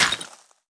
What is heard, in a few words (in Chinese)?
可疑的沙砾破坏声